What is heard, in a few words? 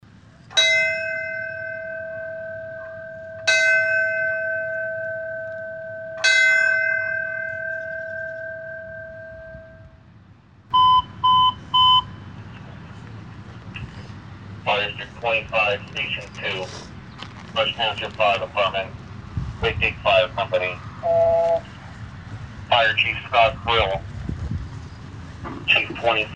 last call tones, fire chief; Quakake; hometown Quakake